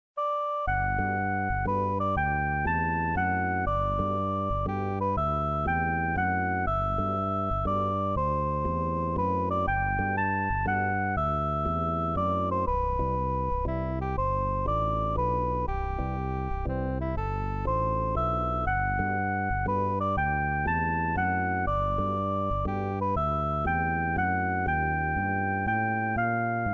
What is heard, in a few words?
Waltz